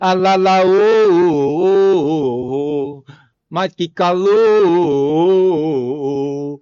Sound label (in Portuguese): cantando